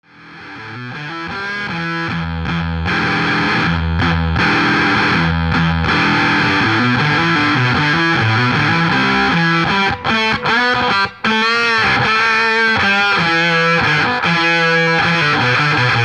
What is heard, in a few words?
FOXX TONE MACHINE